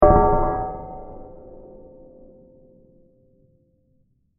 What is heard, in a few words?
Long Metal Bell Impact; Bursts; Impacts; Crafted Signals